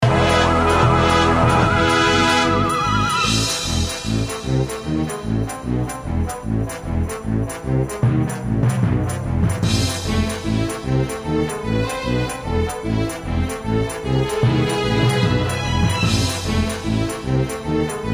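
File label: Level Loop, music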